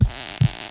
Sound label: ice egg damage